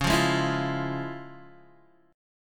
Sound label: F Minor Major 7th Sharp 5th Db, guitar chord